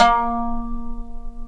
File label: mandolin, Guitar; Notes